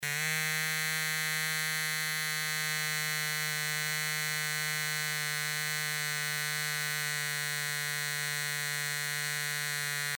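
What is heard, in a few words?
vibration sound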